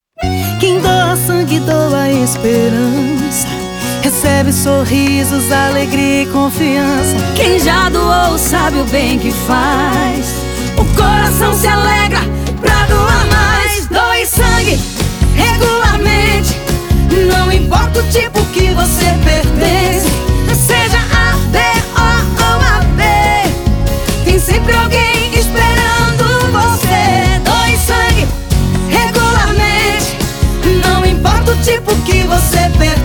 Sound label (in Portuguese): jingle; doacao de sangue